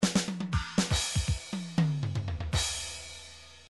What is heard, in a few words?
drums fill, midi drum patterns